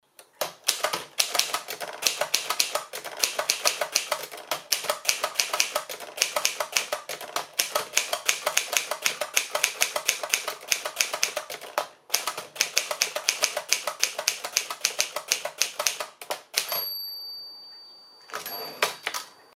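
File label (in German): adler tippa 1, Schreibmaschine; Hörbeispiel Adler Modell Tippa 1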